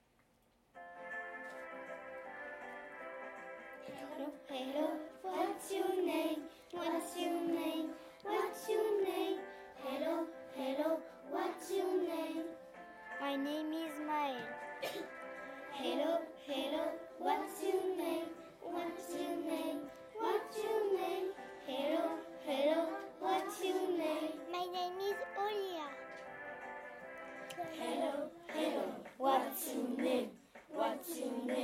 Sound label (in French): chant hello